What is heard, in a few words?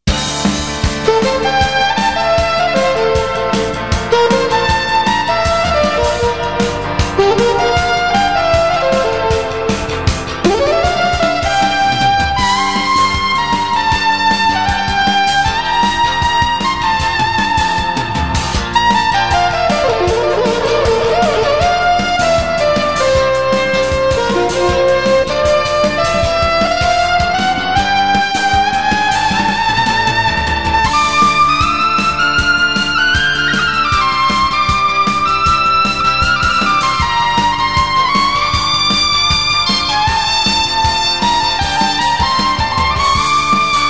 Mizu Horn